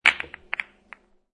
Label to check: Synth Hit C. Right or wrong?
Wrong